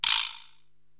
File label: Vibraslap